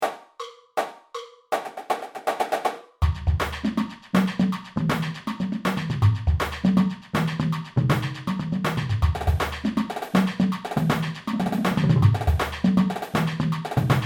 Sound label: Standard 160 BPM